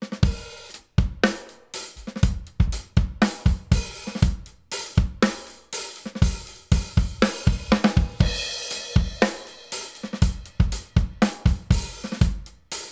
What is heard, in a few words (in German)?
Rupert Neve Designs 5254 Drums 4 zu 1 Fast Fast GR 6 dB SC 100 Hz